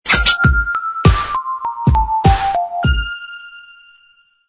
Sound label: magic